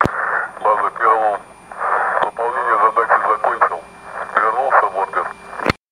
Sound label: expedition complete